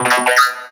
Sci-Fi Lasers - Droid Talk; Antidote Advent

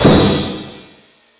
Sluggo Drums, Drum Machines

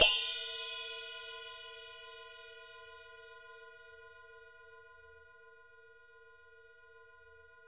CHUNG CHIN, Chinese cym; AKAI S6000 CD-ROM; WorldSounds

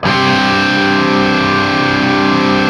5TH C looped, Guitar; East West; Steve Stevens Guitar Samples Collection

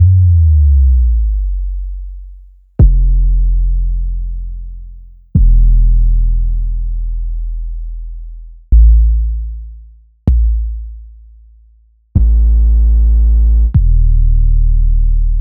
808 trap 808s

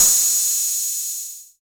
HH 606, TR-606, analog; Sound & Vision - Gigapack I

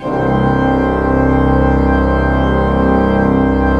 PLENO C1, Cathedral Organ; ILIO; Propeller Islands

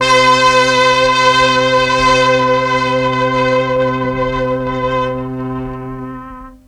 MEL STR, Legendary M400

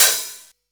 Open HH, Cymbals

